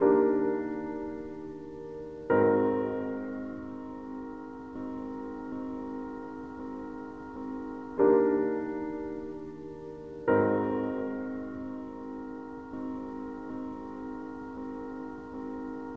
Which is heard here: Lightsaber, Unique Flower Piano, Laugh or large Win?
Unique Flower Piano